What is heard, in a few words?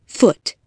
foot